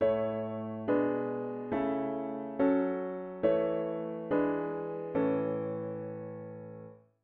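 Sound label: diatonic inverted V7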